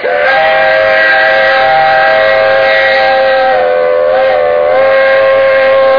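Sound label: endless steam train whistle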